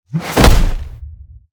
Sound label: Melee Attack; children of morta; Scorpion King